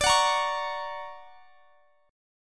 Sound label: D Augmented Major 7th; strummed; x,x,24,23,23,21; chord